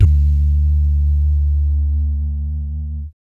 BASS DUMS; Spectrasonics; Vocal Planet; Jazz FX

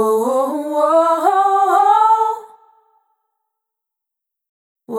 Multi-Voice Loops, Vocal Samples; Apaga La Tele; Sonic Foundry (Sony Creative Software) - Pocket Diva World Class Vocal Samples

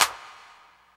CLAP, Dance Drums

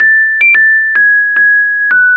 Guitar Based; Eclectic Electronica

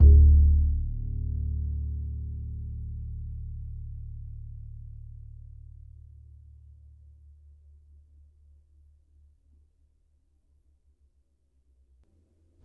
E, Upright Acoustic Bass; Larry Seyer; SWITCHABLE POSITIONS

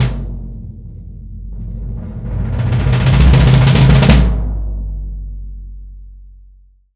GONG BASS

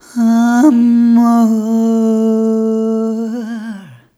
Rhythmic A

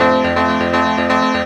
Piano; M8

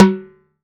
TIMBAL OP; CUBA

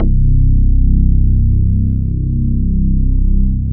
MKS BASS

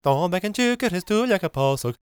GAELIC, World Voices; ILIO; Vocal Planet

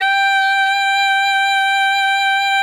G5, ALTOSAX; Xsample VOL-2; 90 s Sample CDs

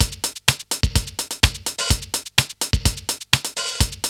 LOOP, TECHNO; TECHNO-BOX; ELEC-LOOPS

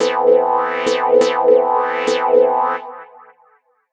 D&B Synth Riff; neuro

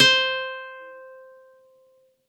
C5, Campfire Nylon guitar; Worra's Campfire Nylon

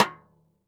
xstick - snares off; JazzFunkKit

